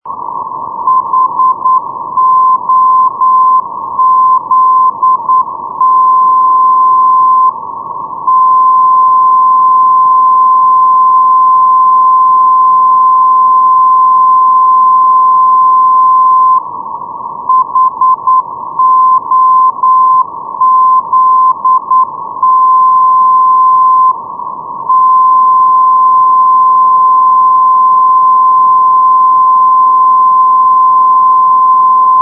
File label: ndb holzdorf hoz 406.0; europe